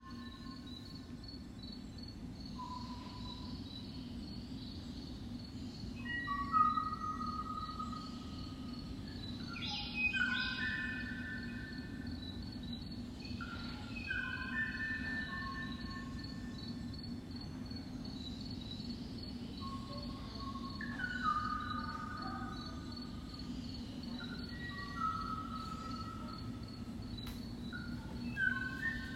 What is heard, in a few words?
Hawaiian Kauai Oo